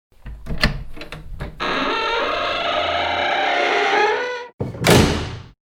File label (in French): PORTE QUI GRINCE